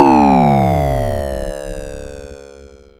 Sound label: FX, Dual Percussion Synthesiser; Klone